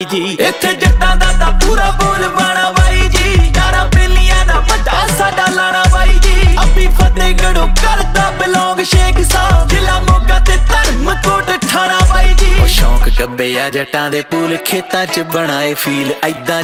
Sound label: Punjabi Beats Ringtone